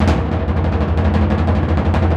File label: TMP C#, Synclavier Percussion